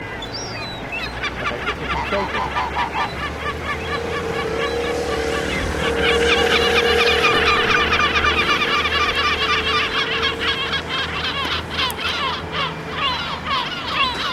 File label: fuscus long call mew call